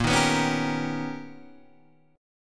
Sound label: B Augmented Major 9th A sharp, chord